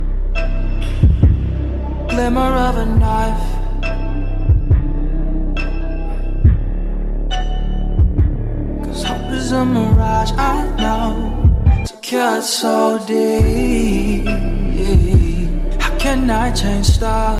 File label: english song ringtone